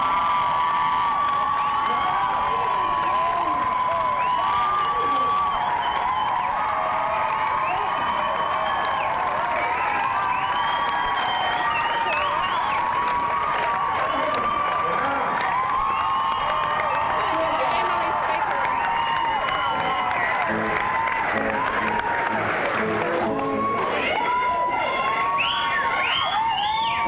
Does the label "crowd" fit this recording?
Yes